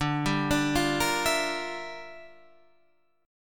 G Minor 13th D

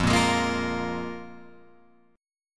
F Minor Double Flat 5th F down Guitar Open F; Fmbb5 strummed; JGuitar

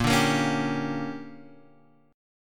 D Major 7th Suspended 4th Sharp 5th

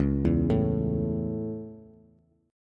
Db Minor Sharp 5th Db Bass, Dbm#5 chord; arpeggiated; JGuitar

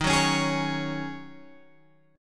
D sharp Minor 11th D sharp Guitar Open G, chord

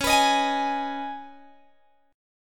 Db 7th Suspended 2nd, Guitar, Open G

